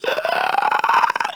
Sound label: Infested Runner Patrol; Warframe; Runners